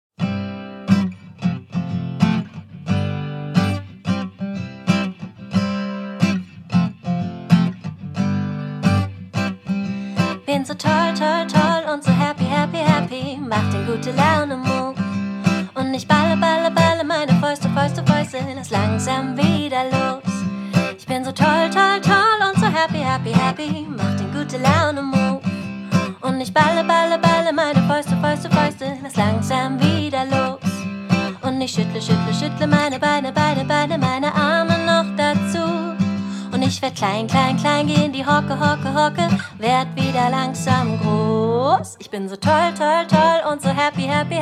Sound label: Happy Song